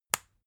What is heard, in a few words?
spice jar small close